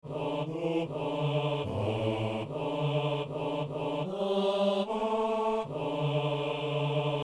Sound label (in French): hommes; Psaume 91 (92); Messe du 17 janvier à Saint-Denis